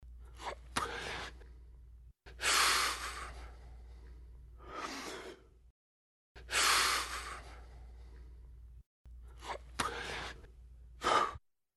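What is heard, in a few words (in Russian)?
Сигарету курят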